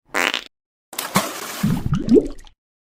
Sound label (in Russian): govnoe, zvuk govna